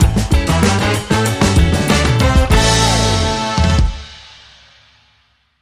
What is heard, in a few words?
Funk